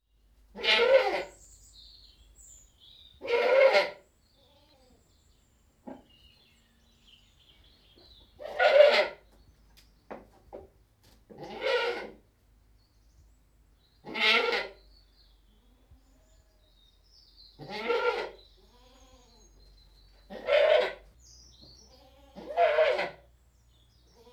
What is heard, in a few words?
One British Saanen Goat Bleating; Livestock; BBC Sound Effects Library